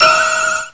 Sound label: piece hit; COMBINEM; 501 Great Games - Volume One; Guildhall Leisure Services